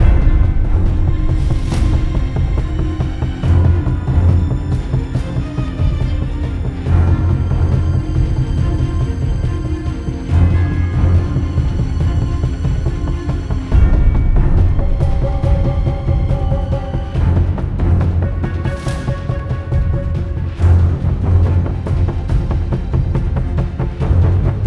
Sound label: intensity; arcane